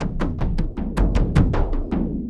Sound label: Frame-Kendang Moving, drums; Sonic Foundry (Sony Creative Software) - Friendly Fire